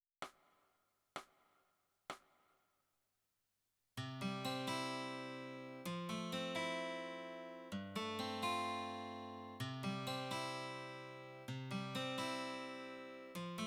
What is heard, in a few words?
Guitar